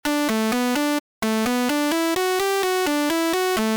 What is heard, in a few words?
attack fm